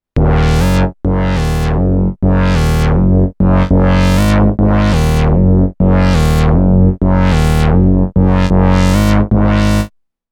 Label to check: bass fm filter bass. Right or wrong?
Right